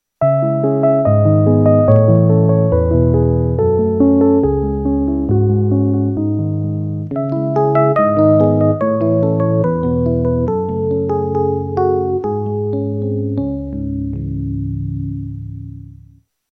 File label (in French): piano electronique; instruments